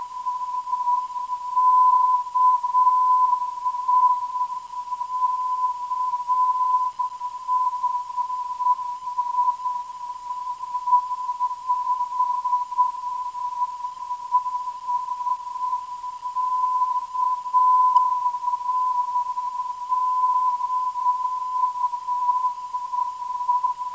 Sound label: 825kHz, NDB